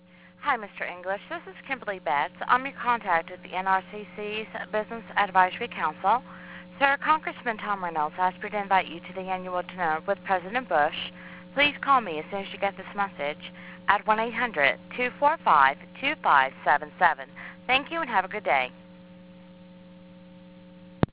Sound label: bush